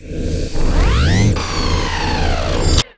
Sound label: ship arms open; Earth and Beyond; EA Games